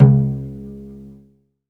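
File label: SOLO CELLO, Synclavier Strings; ILIO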